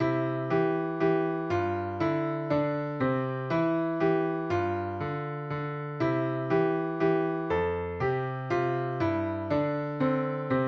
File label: german dance, piano